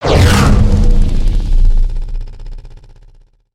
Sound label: Space Marauder Charge; Valiant; aronwk collection; ndaudio